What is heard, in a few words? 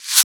Shaker; FLPRO